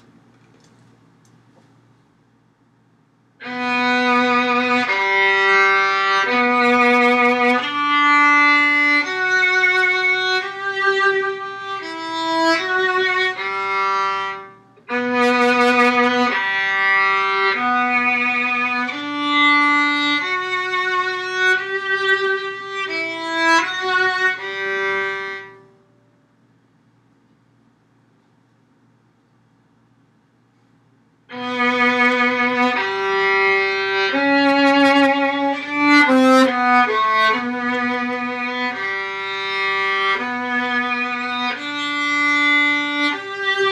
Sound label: Deck the Halls cello part